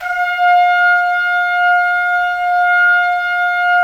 Trumpets; Roland - LA Composer vol. 4

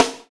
SNR, Snares; AMG; Now CD-ROM (Roland); NOW! Drums

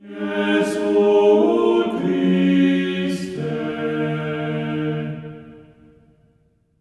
JESU D#4, Symphony of Voices; Spectrasonics

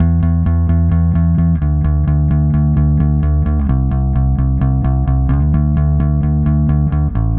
Bass, Indie Rock; Sonic Foundry (Sony Creative Software); Indie Rock Anthems; Session 10 - Roswell

